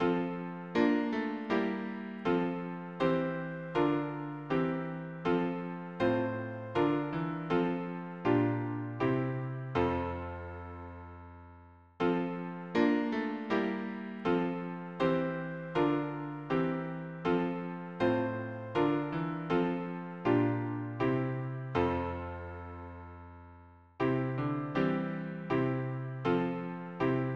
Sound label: harmony piano